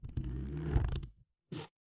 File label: cartoon stretch